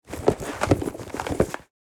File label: Leather purse searching and rummaging; Wallet and Purse